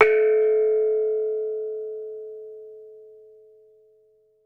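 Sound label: METALOPHONE, Pitched Percussion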